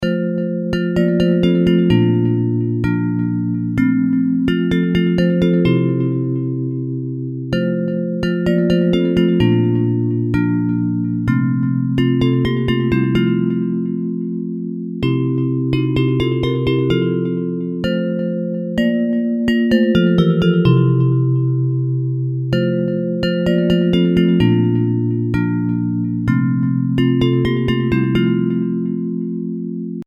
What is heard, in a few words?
Bells; What a Friend We Have in Jesus; Praise